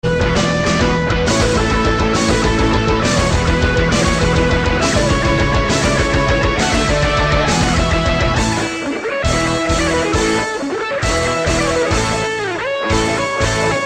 Win; Project Reality BF2 1.6

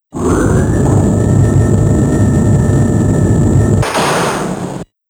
ELEVATOR; final fight original sound collection

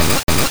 Crunch

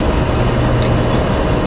Machine Gears Loop; lucius ii the prophecy gamerip 2015 pc macos linux

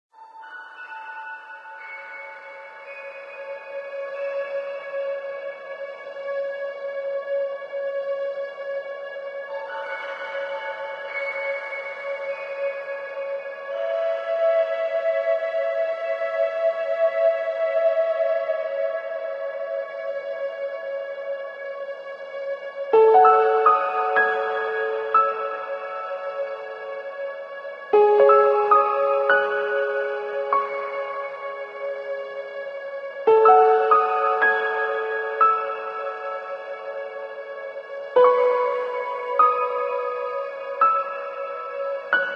Village Sting; ether one redux gamerip 2015

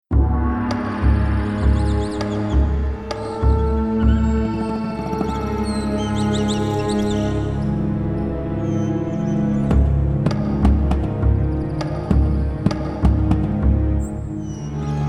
Peace Tense; Serious Sam 2; Mdigbo City Suburbs

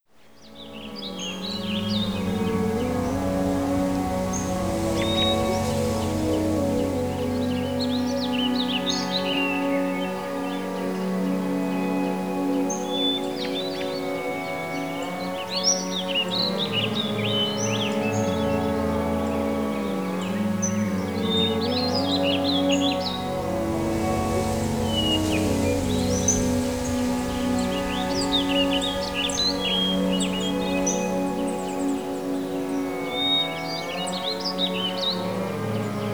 Forest and Hills Ambience; Monster Hunter; Area 7

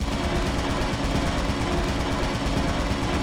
Turret Fire Distance; Lethal Company

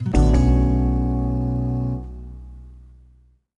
Incorrect; mind. body. soul. blend it 2010 nds gamerip

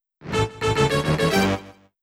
Jingle Stomach Medicine Cold Medicine

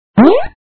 jump; Monster Arena

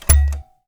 Decontamination Button; Among Us

